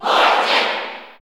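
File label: Cheer English, crowd; Morton; SSBU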